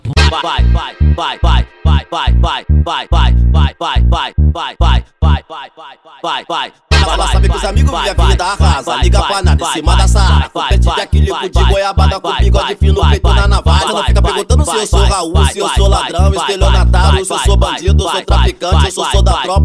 mecanica em geral edicao funk

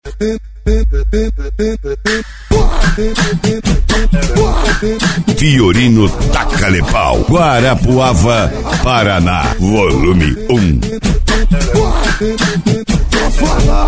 especial funk e electro house

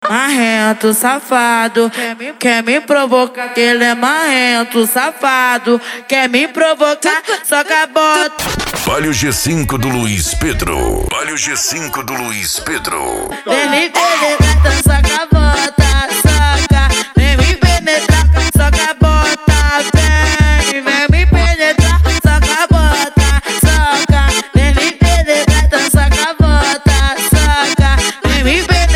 funk mega bass e forro; urupa ro

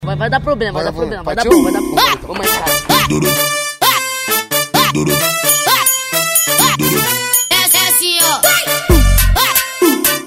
especial funk

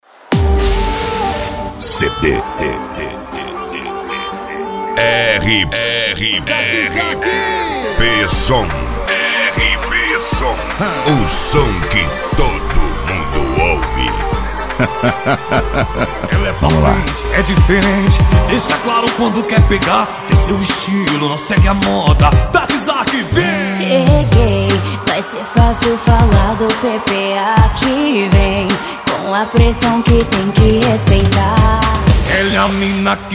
sertanejo universitario

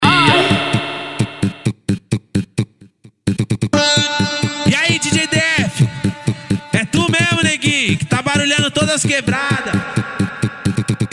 sound car funk; street

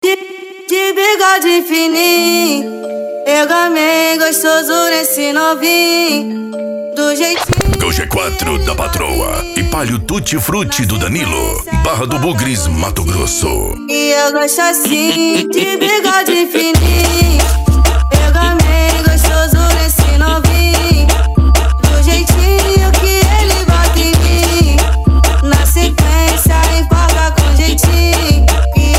funk mega bass e flash back

